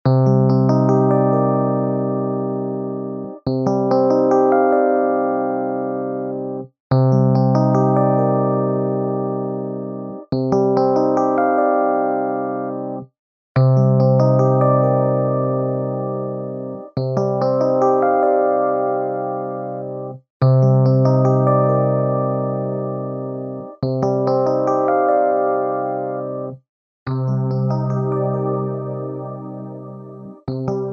FM Rhodes; ChorX

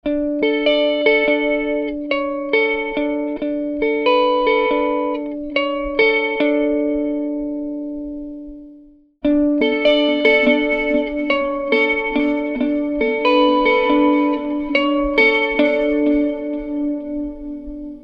El Guitar; RingX

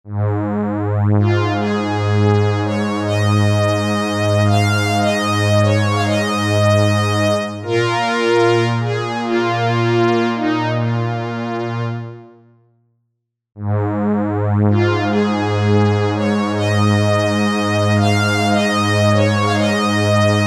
FM Strings; ChorX

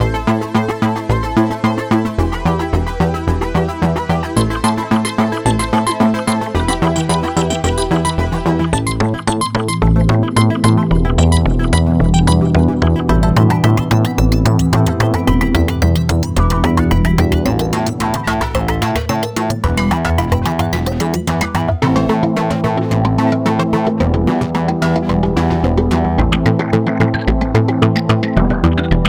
Arp Basses; KaoX; Digital Alchemy; Cardinale

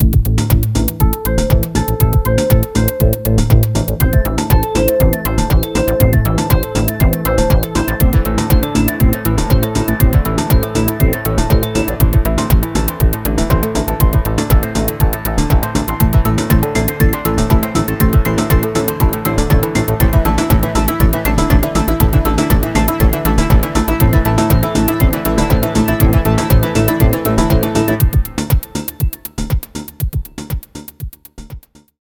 Dance Hit Arp Basses; PolyKBIII; Cardinale

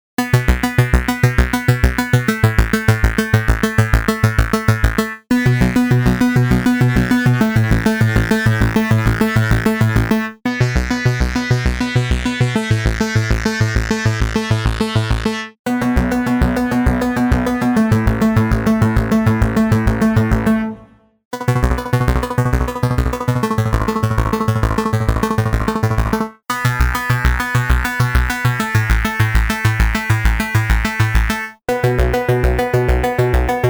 Bass Synth; RingX

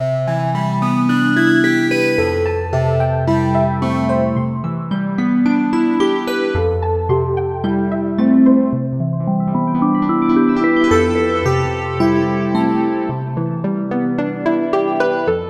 Keys; KaoX; Digital Alchemy Keys; Cardinale

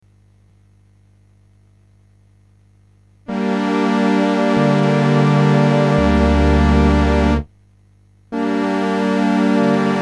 CompString; PolyM

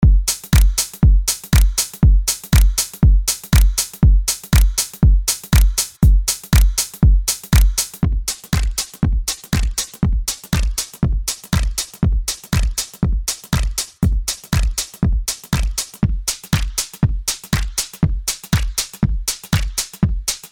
Drum; ChorX

